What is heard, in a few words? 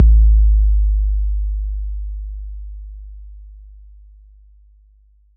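bass drum 808 Tube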